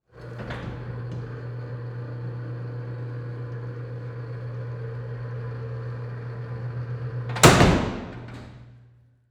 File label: Emergency Door Close Recreation Area Distant; emergency effects; AdobeCC